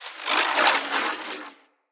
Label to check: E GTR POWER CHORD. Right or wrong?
Wrong